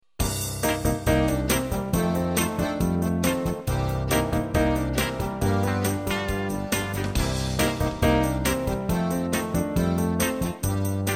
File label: Cajun Blues